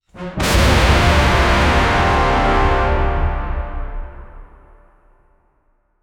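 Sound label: extremely loud fortississimo, brass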